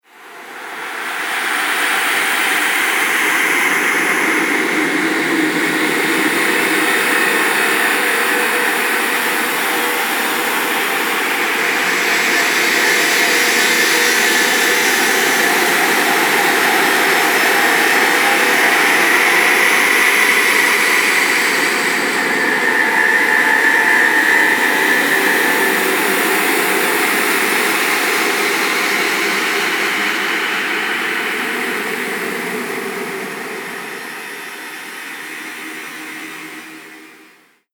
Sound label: Dark Electricity Drone; Scary Soundscape Sound Effects; Horror Sound Effect